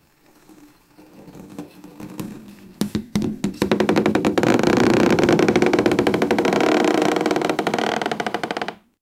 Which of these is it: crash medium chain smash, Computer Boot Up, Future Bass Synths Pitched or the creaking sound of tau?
the creaking sound of tau